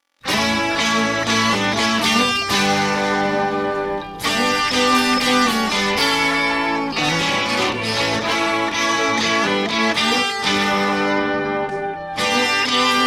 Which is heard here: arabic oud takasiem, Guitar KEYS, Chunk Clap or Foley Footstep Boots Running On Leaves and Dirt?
Guitar KEYS